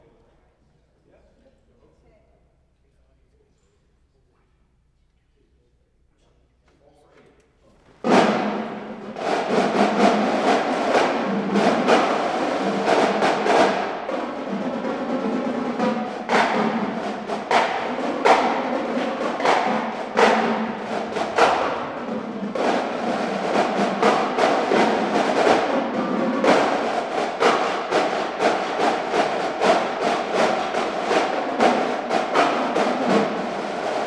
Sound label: Drum Solo; north star ensemble practice plymouth ma